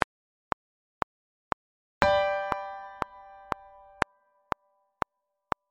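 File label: piano non reverb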